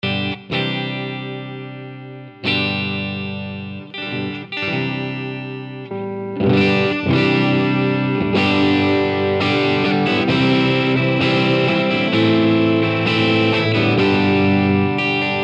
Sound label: crunch tone pop strat sim analog; line6; tonecore; simulanalog